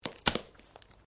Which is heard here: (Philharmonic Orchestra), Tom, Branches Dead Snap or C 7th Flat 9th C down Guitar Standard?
Branches Dead Snap